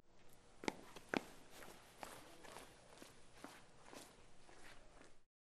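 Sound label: Left to Right Footsteps, Foley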